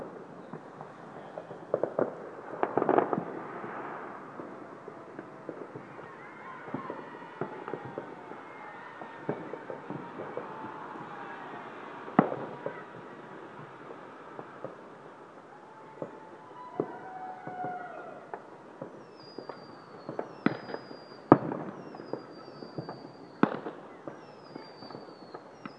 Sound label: new years fireworks across southeast london